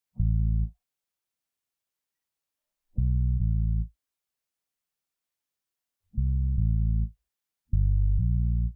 Bass

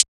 HI HAT

